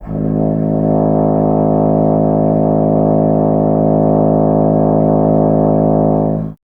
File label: BRS TUBA, Solo Instruments